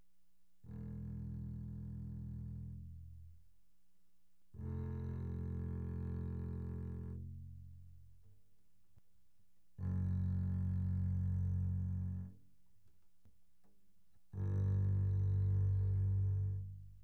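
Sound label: Bass arco pianissimo sul E E1 B1, doublebass; Strings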